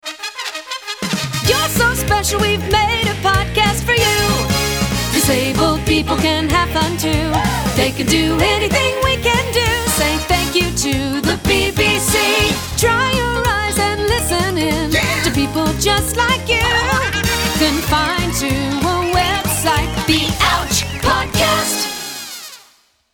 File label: ouch